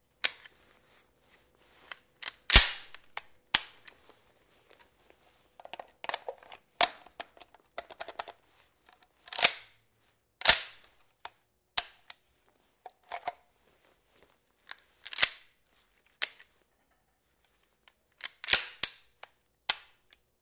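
vstavka obojmi v pistolet, inserting a magazine into a pistol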